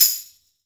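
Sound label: tambourine open, GM drum set; AKAI S-Series CD-ROM Sound Library VOL-8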